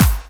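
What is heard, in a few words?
snare drum bass drum, Dance Mega Drums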